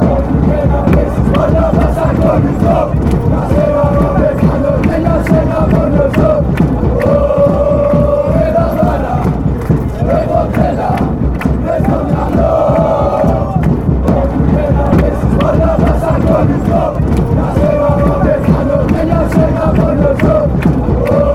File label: greece chant, fanchants